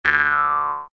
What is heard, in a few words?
Rake